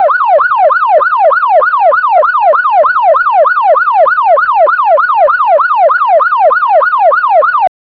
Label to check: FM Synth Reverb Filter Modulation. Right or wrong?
Wrong